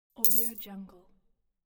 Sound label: silver coin drop; wistansound